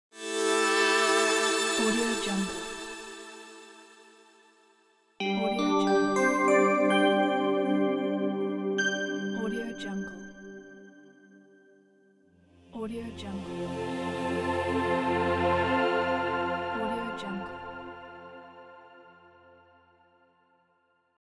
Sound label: transitions pack